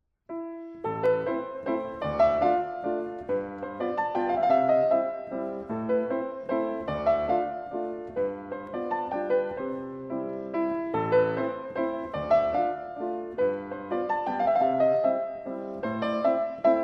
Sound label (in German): walzer